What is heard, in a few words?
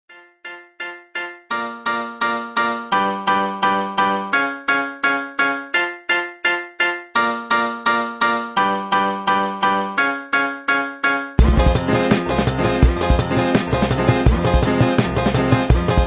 Piano Ringtone